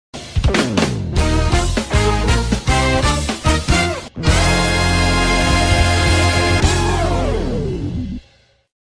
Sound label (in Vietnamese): Nhạc chào MC ngắn